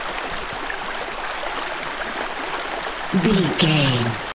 river stream night flowing water insects loop short